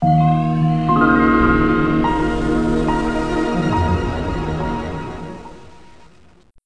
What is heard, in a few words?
Windows Startup; Windows Never Released Soundpack 2